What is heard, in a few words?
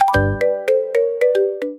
order alert